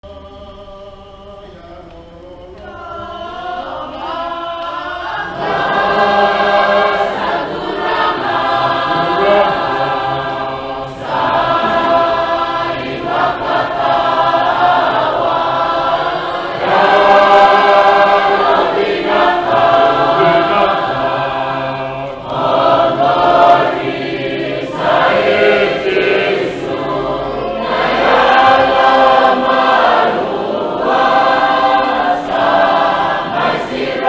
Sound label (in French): chant de communion; oceanie